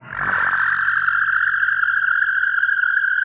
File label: Feed liquid, Feedback FX; 99Sounds; Dark Engine